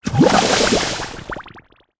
Soapgun Shot

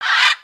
Beg, creatures pets

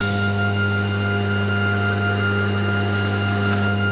Elevator Travel Down

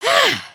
surprised

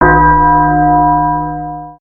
churchbell

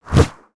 Owl Wingflap; creatures organic; Valiant Summoner

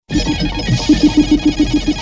Racer Computer Idle; gnarled forest

